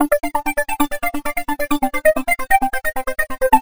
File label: Synth Loops, Dm 132; Zero-G; Soundclash Nu Dance Electro; Acidized; 132bpm